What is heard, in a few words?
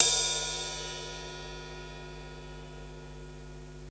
CYMB; AMG Gota Yashiki Groove Activator; GOTA CYMBALS